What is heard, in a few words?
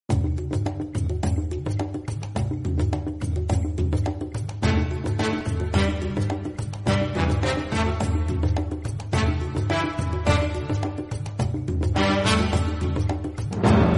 theme music; One Piece